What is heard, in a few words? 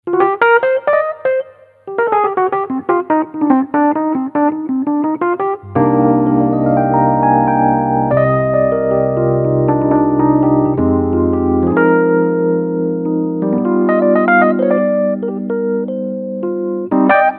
Rhodes; collectie vintage instrumenten